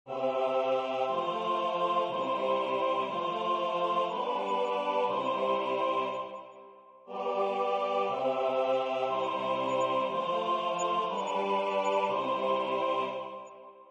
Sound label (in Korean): 주3화음 전체